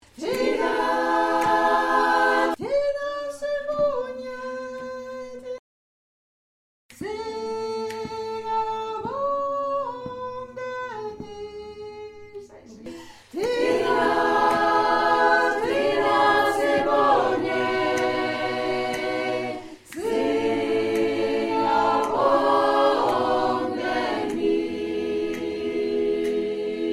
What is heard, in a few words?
Tenor